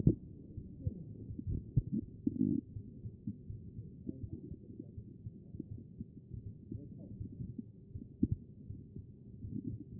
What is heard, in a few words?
A CHEST; B MODE